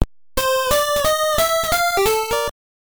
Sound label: Death by Fall; donkey kong; gameboy